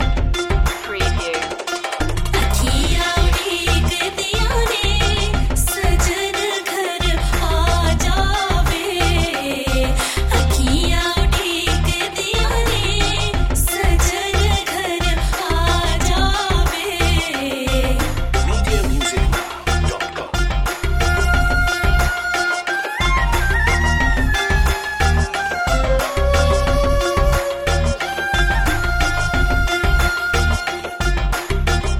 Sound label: the magic sitar loop